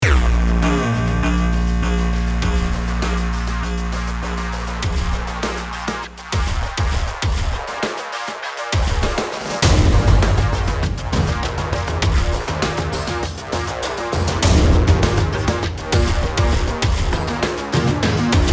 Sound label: ANALOG